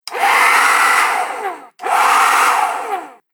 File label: Hairdryer On Off Twice; Miscellaneous Household Sound Effects; Foley Sound Effect